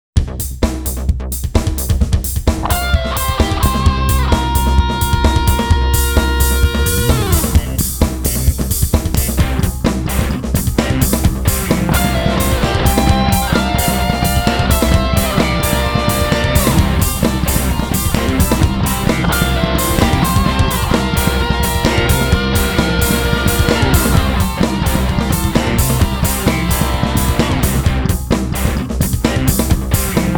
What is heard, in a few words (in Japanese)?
セッション・エントリーBGM